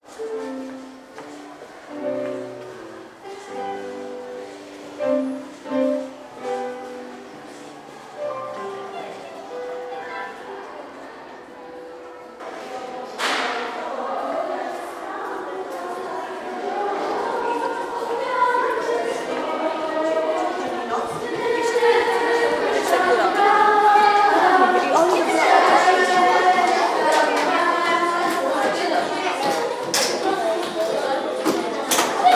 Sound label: Primary Musical School